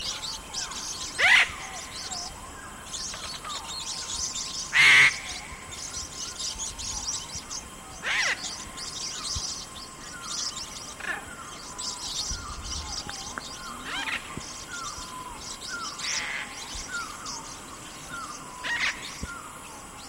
Lori Sombrio

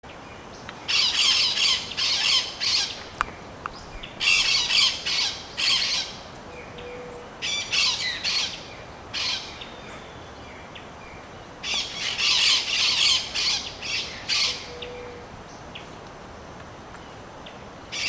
Loro Alibronceado

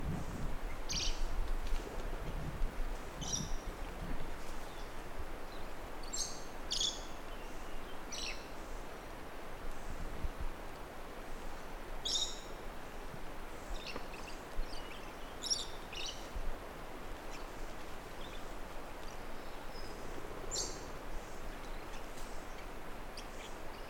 Lori de Seram

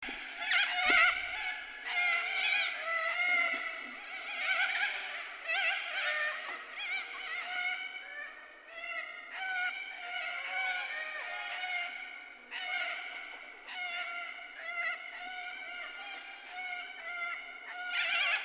Cacatua Cavadora

